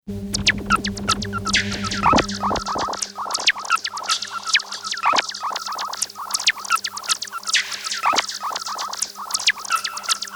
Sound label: Guitar delays